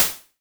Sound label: hihat